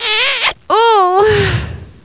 kiss gasp; knight

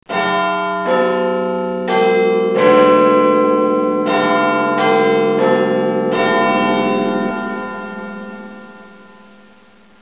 wchime; vox

